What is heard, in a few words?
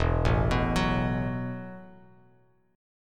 E Suspended 2nd Suspended 4th E, Bass Standard; arpeggiated; JGuitar